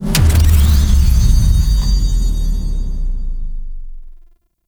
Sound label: Sprag Spawn Stinger; Warframe; Veilbreaker; Venkra